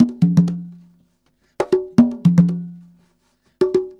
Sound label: con, Percussion; Big Fish Audio - DOM